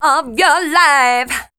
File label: voice spectral, ly short